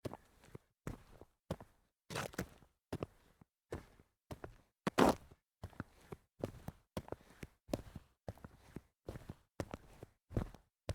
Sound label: sneaker on concrete series slow, foley